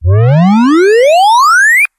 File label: whir up; Zaps and Beeps